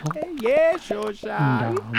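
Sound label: Music Village Calm Inside; from dust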